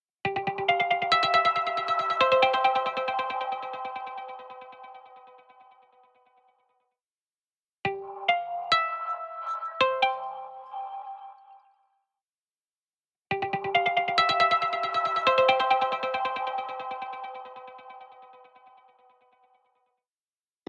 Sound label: Guitar Harmonics Rotating Taps Right Stereo; MicroPitch Immersive